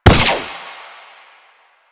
Gunbrella Bullet Deflect, Weapons; Warframe; Tenno; Koumei

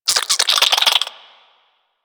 Infested Minion Attack, Vocals; Warframe

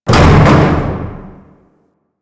Large Elevator Door Close; Elevators

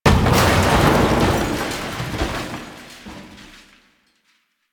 desk destroy; ambience; Warframe; Entrati

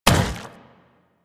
Zombie Run Metal F, Footsteps; Warframe; Infested

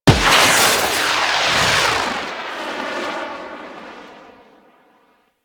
Seeker Drone Shoot Flare; Warframe; Eidolon; TuskSeekerDrone

